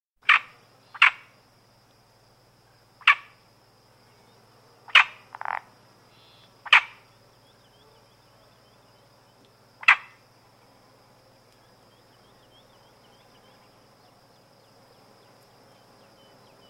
Iberian Marsh Frog, adult male croaking with Woodlark and Great Grey Shrikes; BBC